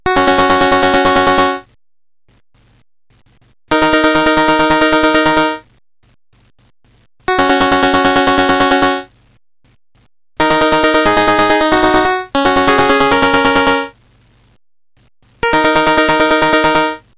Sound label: rotary; ringtones